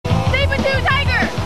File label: Sabertooth Tiger